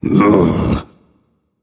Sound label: ugh; smite; anhur